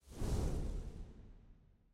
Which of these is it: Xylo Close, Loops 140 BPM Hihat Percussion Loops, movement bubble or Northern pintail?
movement bubble